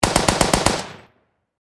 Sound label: Warfare Machine Gun .303 Caliber Vickers Short Burst Close Perspective